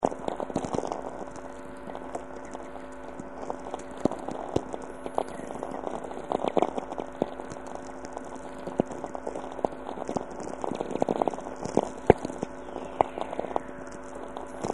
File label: whistlers, Auroral Chorus Music Of The Magnetosphere